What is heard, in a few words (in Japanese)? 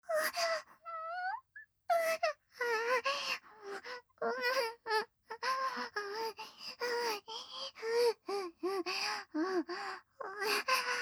喘ぎ